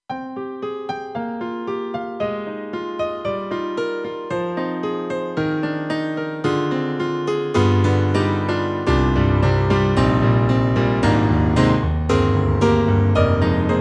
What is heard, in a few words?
Stereo Grand 3-Way; M3 Version 1 Wave; KORG M3 XPanded